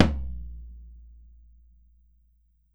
BOMBO FF, Brazil Drum Perc; E-MU Producer Series Vol. 7 – Old World Instruments

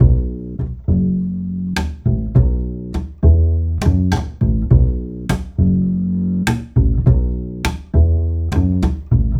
Acoustic Bass

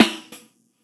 Snr; Roland MV8800

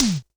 Synth drum; Sony DRP-2

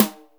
Snare, Akai MPC500

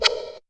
RESO HHO, Hi-Hat; AKAI S-Series CD-ROM Sound Library VOL-4

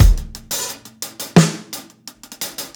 HALT TIME 16THS, breaks, drums; Basement drums vol 2

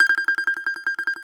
BAGLAMA, Plucked Strings

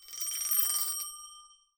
TELEPHO, BELLS CHIMES; Voice Crystal - Steve Reid Definitive Percussion

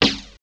SIDE SN R; East West; Phat & Phunky

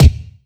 127 DRM, Fresh Disco House; USB Soundscan vol.20; AKAI

